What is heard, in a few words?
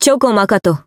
Throw Whiff; BBCF; Izanami